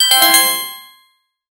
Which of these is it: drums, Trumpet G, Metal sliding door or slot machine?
slot machine